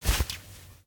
Pigeons

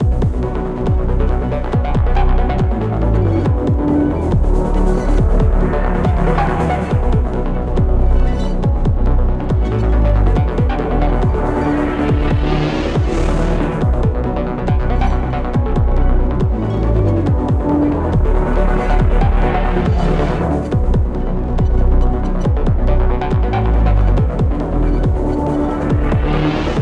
Boss Loop

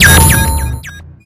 Menu Confirm

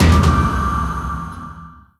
Ui Countdown Over

